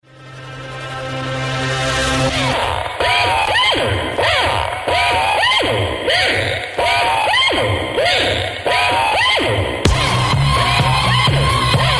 music idol carry; urn run

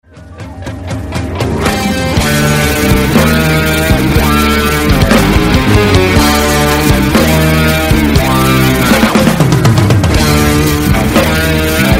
music core exposed lp; Risk of Rain music pack; in-game music

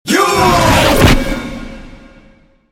death slam cast; lash

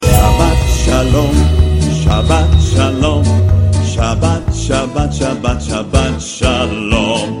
music idol return team; Hebrew urn pack

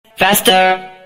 vault hit stinger; sinners

